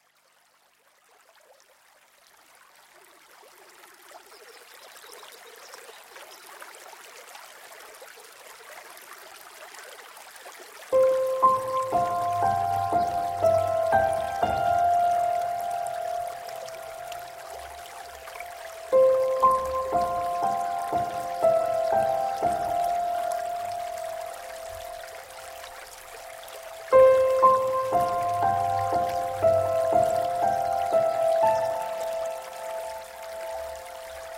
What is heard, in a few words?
Music with the Gentle Stream